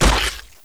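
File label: Damage Cloth; Gamigo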